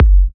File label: F# kicks; markus hakala kicks